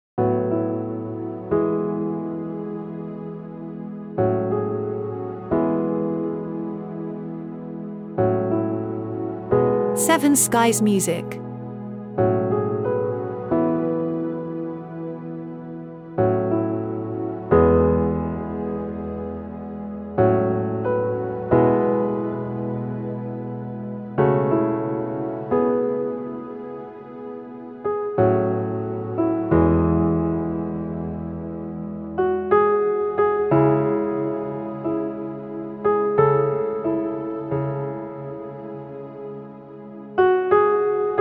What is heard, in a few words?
solo piano